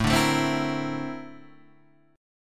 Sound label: A sharp Major Sharp 11th, A